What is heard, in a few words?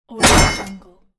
sword slash